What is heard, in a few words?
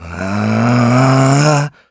Vox Casting; Riheet; kingsraid